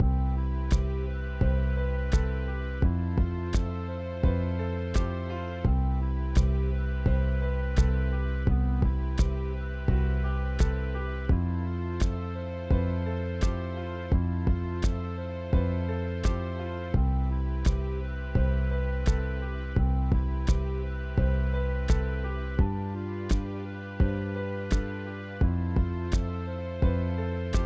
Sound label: Bluse